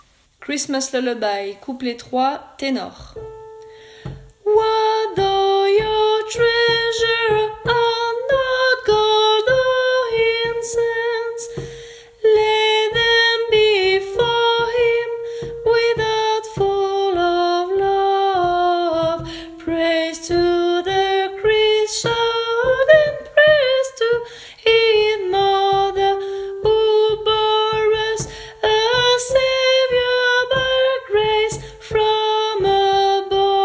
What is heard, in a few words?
Christmas Couplet Ténors